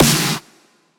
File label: snare roll